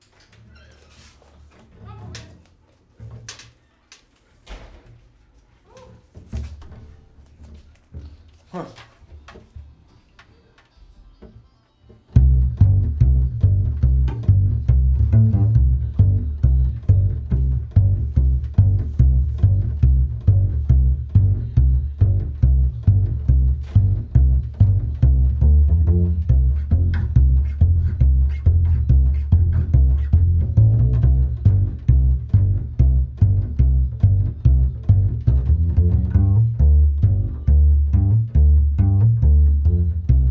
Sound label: bass